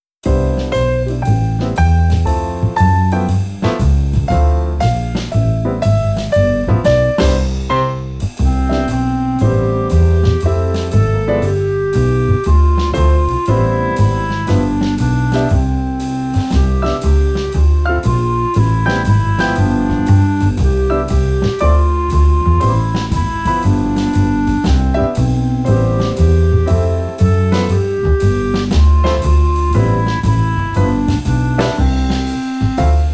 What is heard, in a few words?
Twinkle Twinkle Instrumental